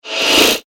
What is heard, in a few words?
Psycho Whoosh Transition Element